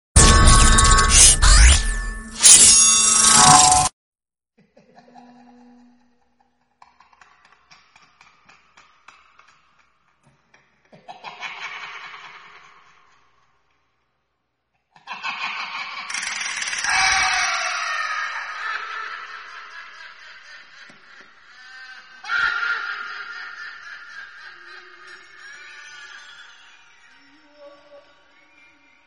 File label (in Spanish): SONIDOS DE TERROR RISA; Pennywise IT